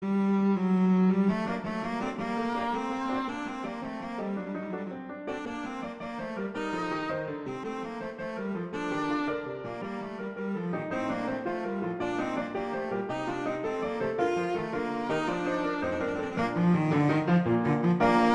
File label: Allegro; B Marcello; Sonata